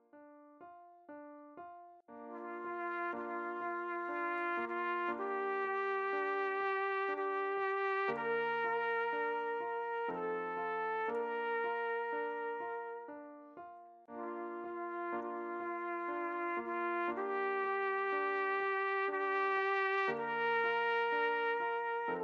Du bist die Ruh Instrumental, trumpet

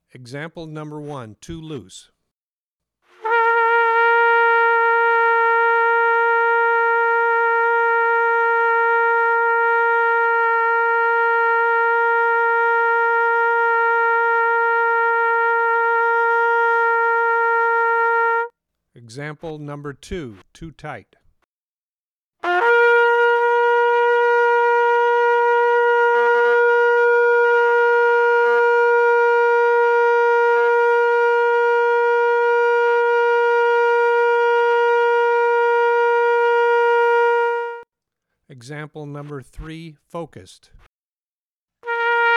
Tone Exercise Recording of 3 examples, trumpet

